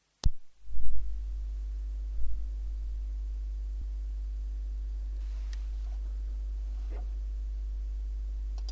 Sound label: noise